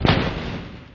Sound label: drop End; puzzleblast